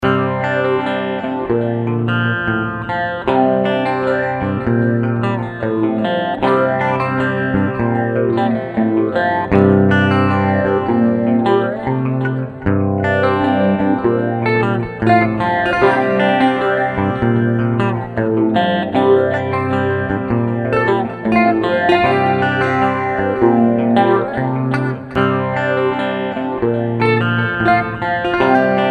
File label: Bone Fire Waves